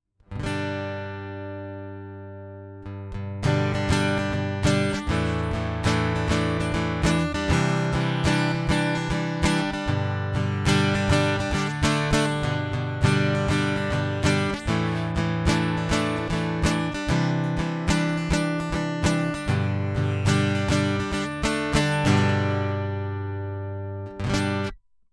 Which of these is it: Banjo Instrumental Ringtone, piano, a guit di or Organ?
a guit di